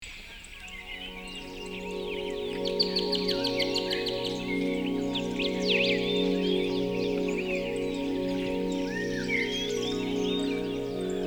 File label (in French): Sons naturels de chants d oiseaux avec musique relaxante